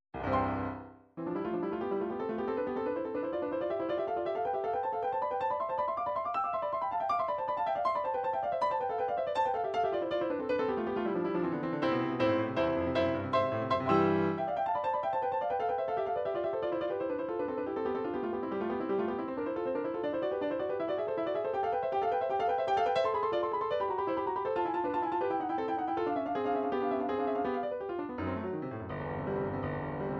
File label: studies for piano, midi